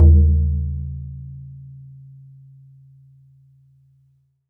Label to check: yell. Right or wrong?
Wrong